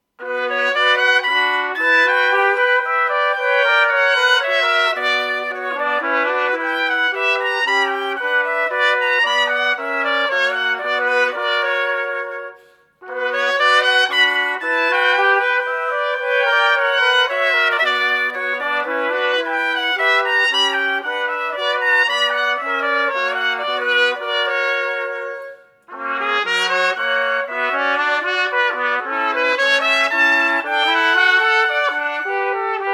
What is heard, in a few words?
Orchestral Suite Menuet; Orchestral Suite No. 2, BWV 1067; Music of J.S. Bach for Trumpet